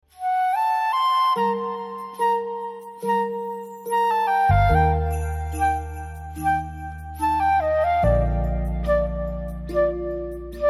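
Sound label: background music Telugu Ringtone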